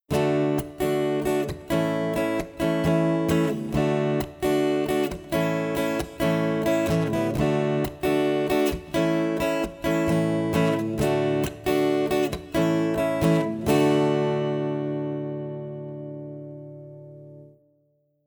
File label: D to A Strumming Example, guitar